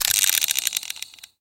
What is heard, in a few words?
revolver spin